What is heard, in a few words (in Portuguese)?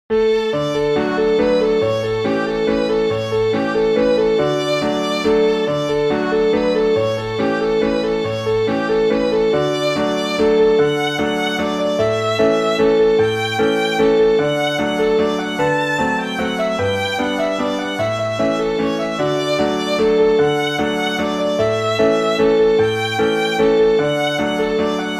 mazurka